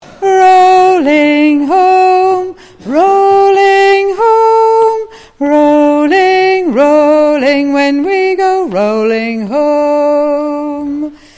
Chorus Bass

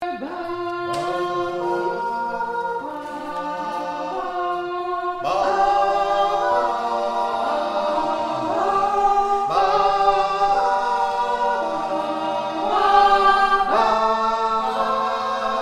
bass

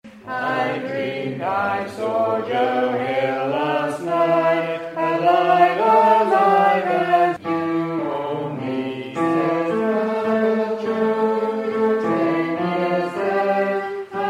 bass

